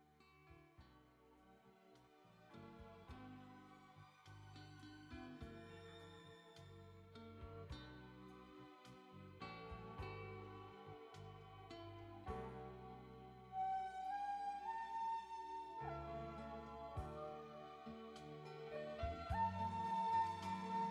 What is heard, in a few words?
Strings Outro; AGrace